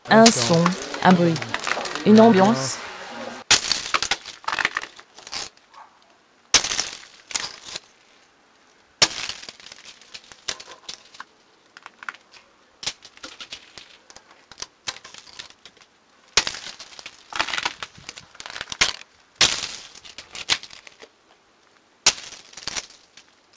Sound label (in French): Un son un bruit une ambiance; Les oreilles du monde